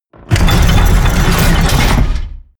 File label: portcullis open